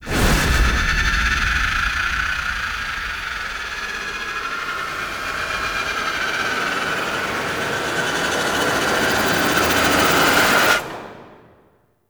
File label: Queen Mind Drill; Warframe; The War Within; Mirror; Cinematics